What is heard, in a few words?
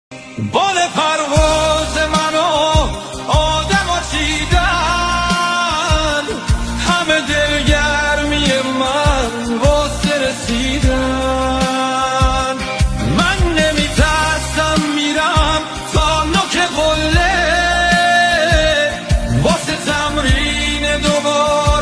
music sad; آهنگ غمگین گریه آور مخصوص اینستا